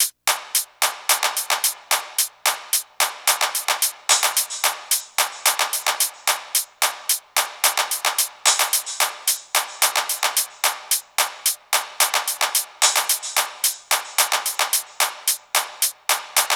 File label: perc 110; Drum Loops; Top Loops; SD MIDNIGHT Sample Pack; Slate